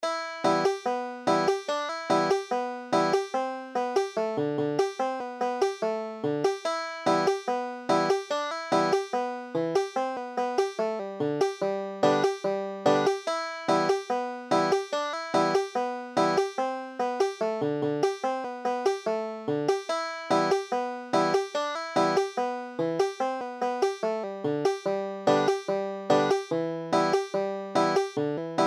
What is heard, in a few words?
banjo